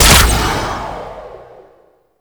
Archer Rain Of Arrows Fire; Warframe; Duviri; Dax Archer; Abilities

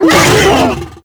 Infested Juggernaut Vocal Pain; Warframe

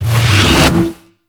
Smoke Screen Start; Warframe; Stalker

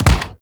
Weapon Punch Bow

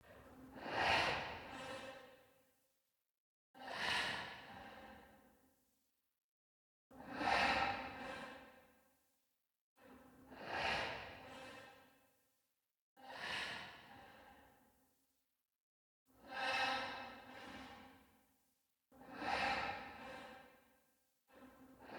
Prisoner Loop Breathing Distant; New Player Login Sequence

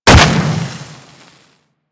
Pulse Exp Impact Default; Warframe

